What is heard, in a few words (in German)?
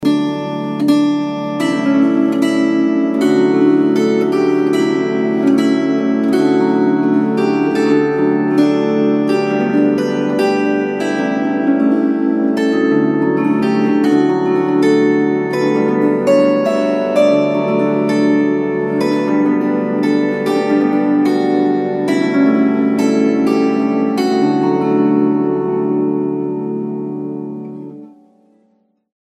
Zithermacher Melodie LAUTER